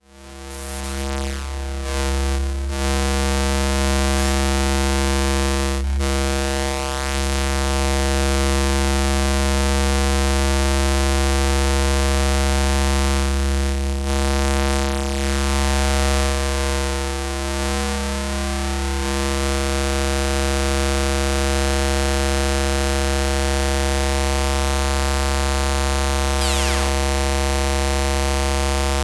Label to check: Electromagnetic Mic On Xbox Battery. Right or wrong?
Right